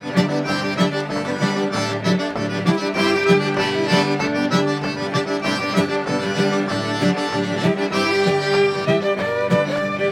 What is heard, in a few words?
creole stomp; loc-musicbox